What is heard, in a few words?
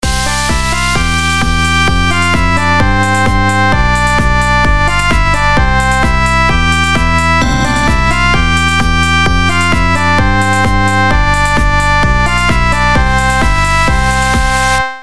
EDM